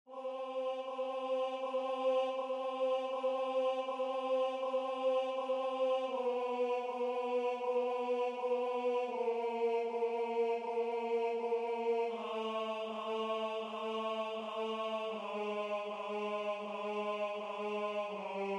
Chorale Tenor; Andantino

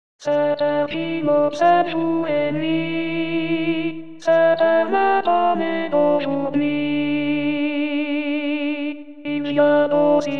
Padam Alto

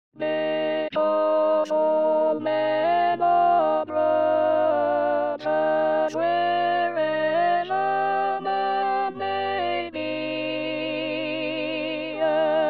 Alto, Chorale

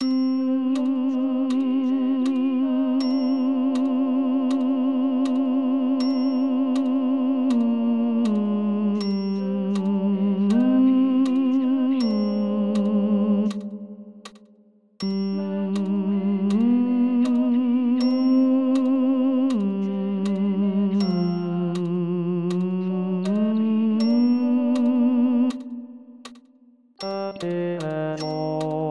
Tenor, Chorale

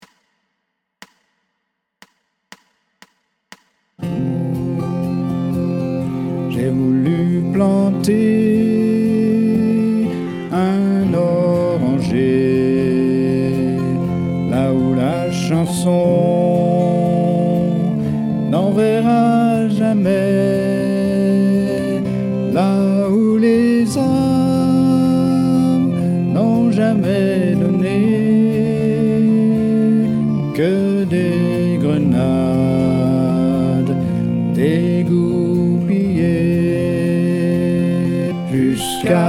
Soprano

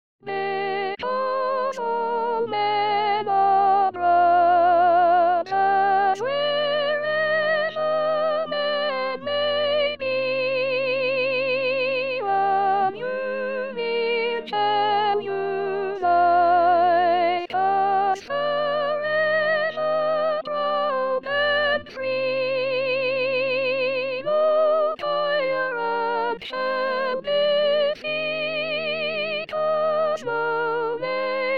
Soprano, Chorale

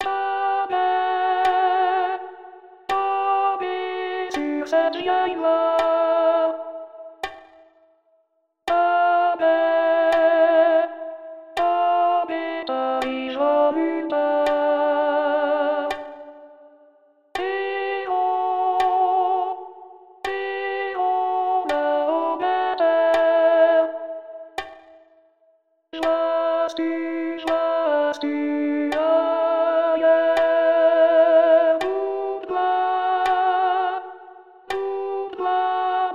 Rame Alto

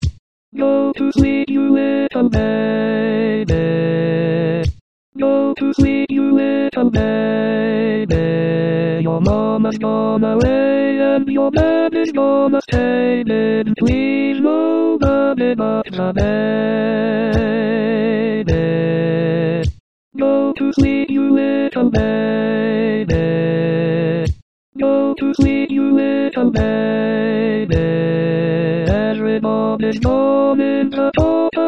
Go To Sleep Alto

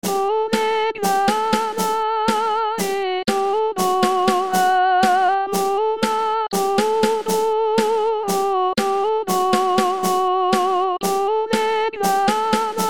Soprano, Chorale; Polegnala

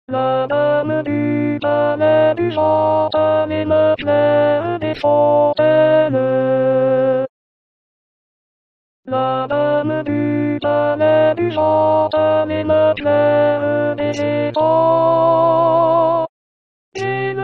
Alto, Chorale

